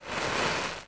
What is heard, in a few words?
kur top; Szkola Koziolka; CyberMycha 2003 October